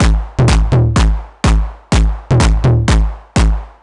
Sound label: drum hardcore stripped 125, Drum Loops, Classic Chicago House